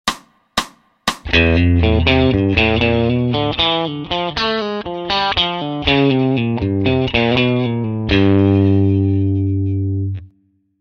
Mixolydian Mayhem Lick

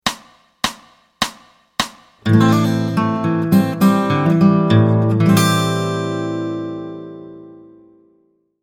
Open Chords With Fingers Am

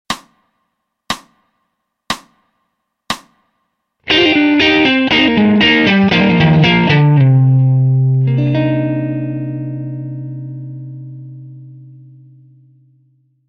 C MIN SCALE Rip fast

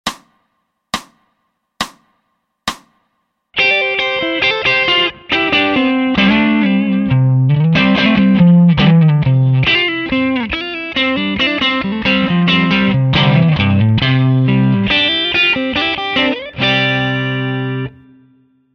C MAJ Rip

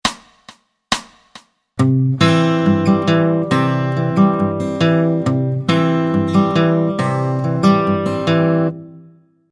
Travis Picking

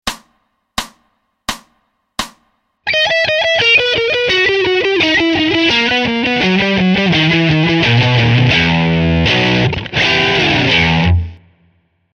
Arps W Chrom Emb

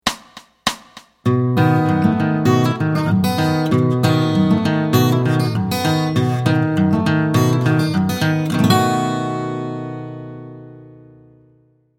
Open Chords With Fingers B7

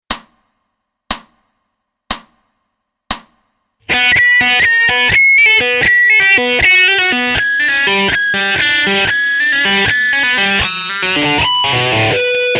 Open String Pull Off Lick slow

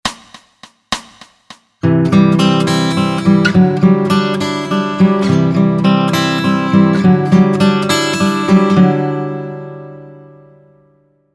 Hybrid Double Pluck

